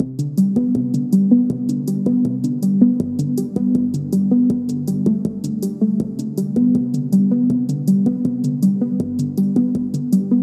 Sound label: digital calm